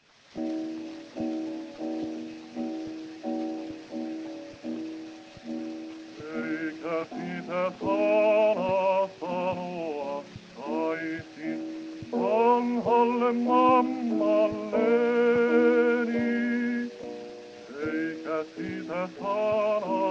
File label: Gramophone